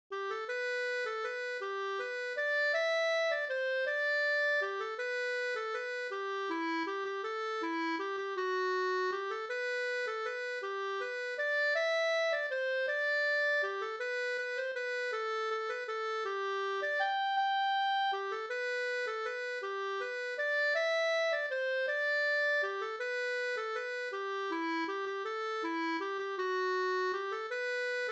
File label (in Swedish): vals G dur